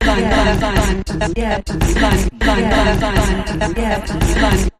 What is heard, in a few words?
Sampled Voice Percussion Variation